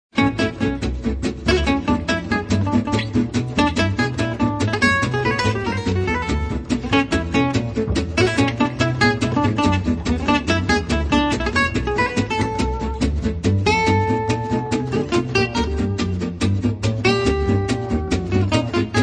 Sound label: acoustic quartet